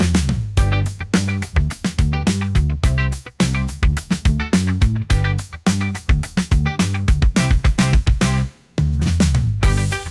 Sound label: Happy Upbeat Funk Groove